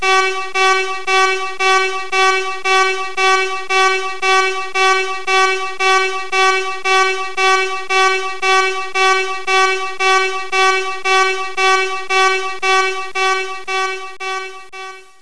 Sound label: freight lift alarm